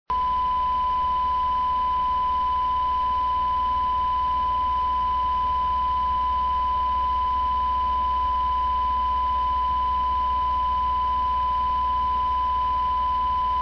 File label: loop hi